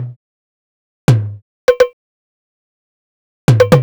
drum loop monday percussion 125, Classic Chicago House; Sample Magic; Drum Loops